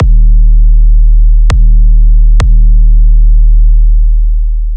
kick

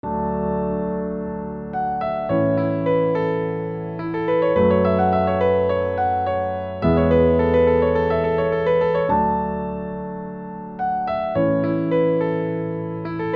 acoustic

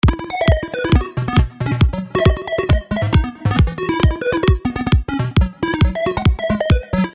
disco

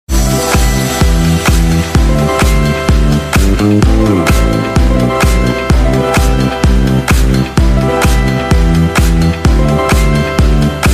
instrumental

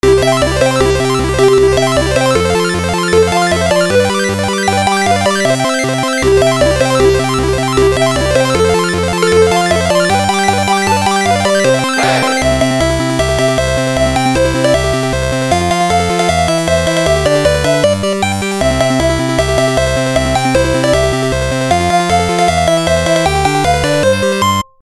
Jingle

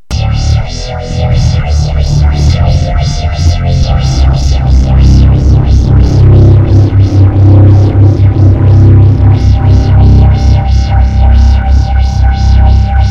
powermetal

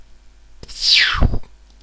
Laser

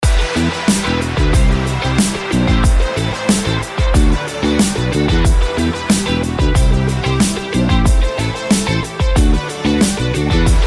instrumental

